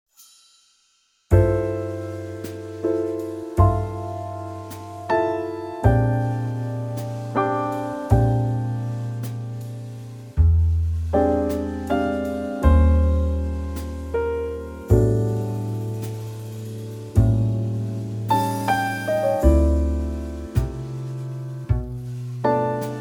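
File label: Dm Trio, backing track; key - Dm - vocal range - A to F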